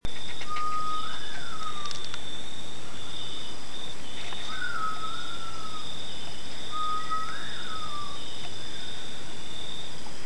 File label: Sri Lanka Bay Owl Phodilus assimilis